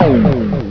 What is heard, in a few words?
Green Shot; Technomaniac 1.1